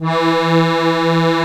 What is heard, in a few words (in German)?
DIATONISCH, AKKORDEON, Alpine Volksmusik